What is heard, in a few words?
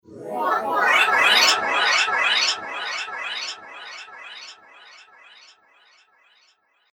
Magical Shimmer, magic sound effect